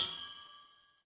impact anvil

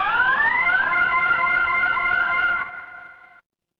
mechanical siren

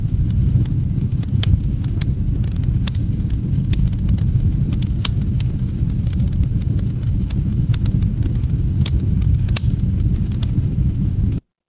ambience fireplace

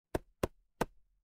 impact wood knock

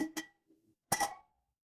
glass ceramic

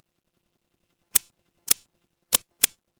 office pen

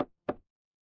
impact wood knock

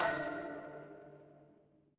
instrument bell

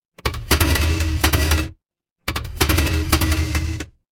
mechanical gear

